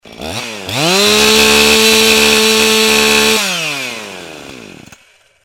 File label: husqvarna chainsaw ringtone